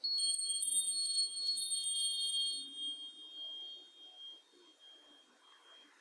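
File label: wind chime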